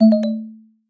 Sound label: low battery; power; chromeos